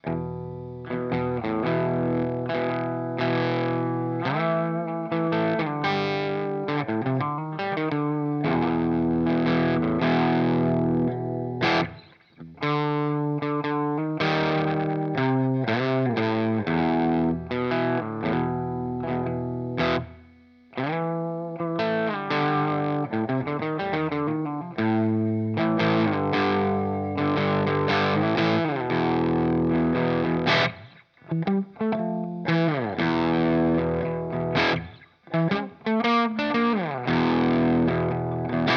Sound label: Germanium Fuzz Humbucker Gain 2; Benson